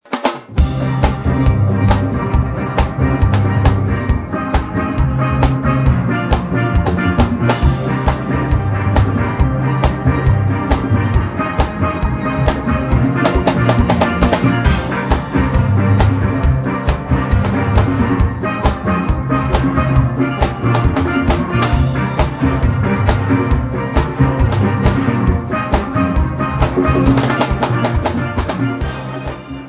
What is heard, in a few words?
jazz fusion on steel